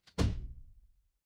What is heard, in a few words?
transition impact hit